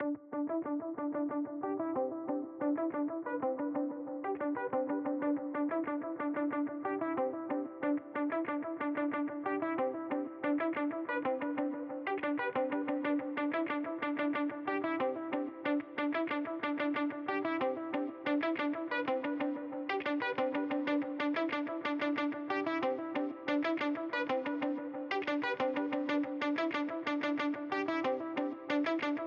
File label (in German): build up